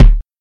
sharp kick drum d key, bass drum single shot tuned to the D note